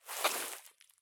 turn grass low; Escape from Tarkov

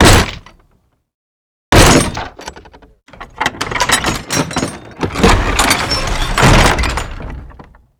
door cellar open; hunted; Doors; ModernWarfare

